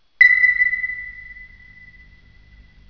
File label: plic